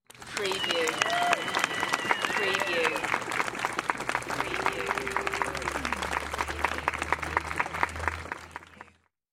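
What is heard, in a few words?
Small Crowd Clapping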